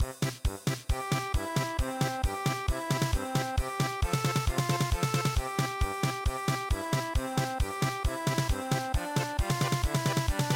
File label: German Polka, traditional; midi